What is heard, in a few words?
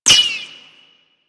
Ricochet; Warframe; Cowgirl